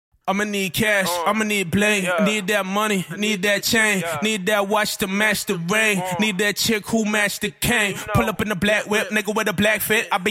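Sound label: hip hop, 122, A minor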